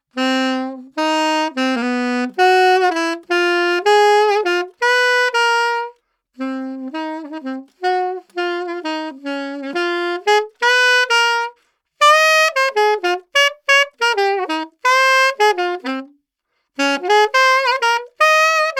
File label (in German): Saxophon